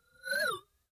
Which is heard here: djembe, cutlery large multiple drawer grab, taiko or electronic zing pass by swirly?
electronic zing pass by swirly